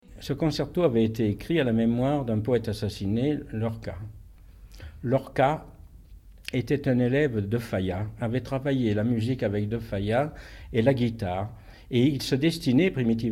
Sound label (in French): Concerto pour guitare; INA; Henri Tomasi